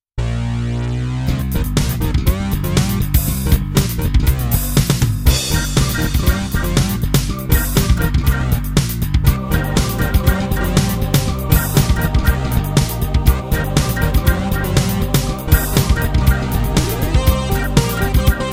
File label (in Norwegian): synt